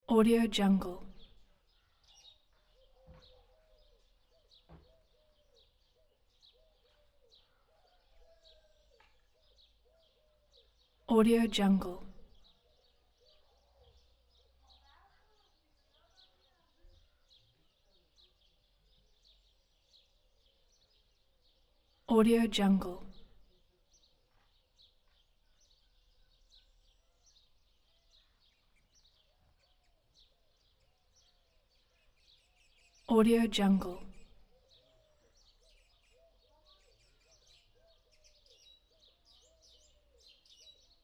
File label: village afternoon movement birds